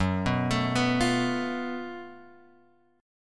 F sharp Minor Major 7th Double Flat 5th, F sharp, guitar chord; F#mM7bb5; {2 2 X 2 0 1} arpeggiated